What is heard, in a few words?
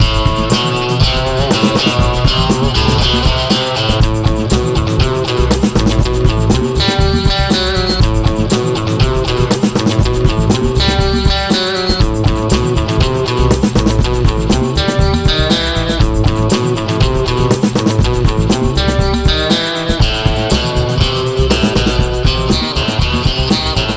Guitar Remix; The Command Room